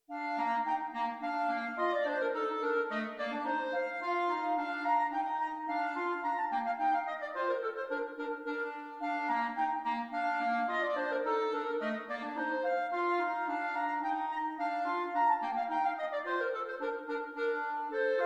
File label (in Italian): duetti per clarinetti